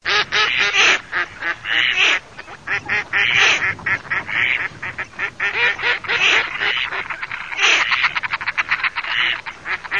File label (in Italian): GERMANI MORIGLIONE